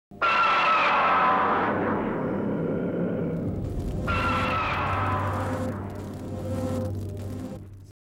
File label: implosion; spacegodzilla